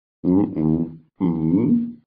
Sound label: uhhh